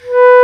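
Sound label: Bass Clar p B3; Digital Sound Factory Virtuoso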